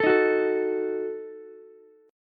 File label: D sharp Diminished D sharp Ukulele; strummed; D#dim; JGuitar